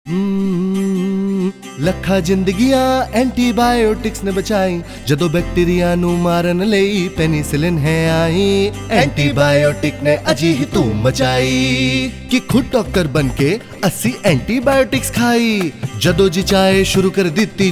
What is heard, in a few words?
Antibiotic Jingle Punjabi; jingles in 19 languages